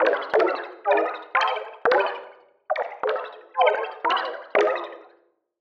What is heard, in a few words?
Synth & Bass; neuro; Sinistarr